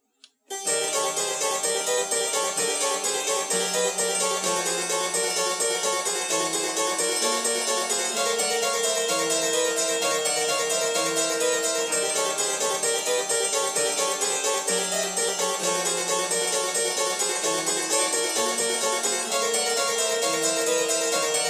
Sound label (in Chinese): 羽管键